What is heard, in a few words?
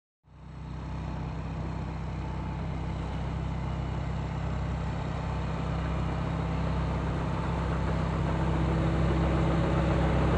Front Loader Passing by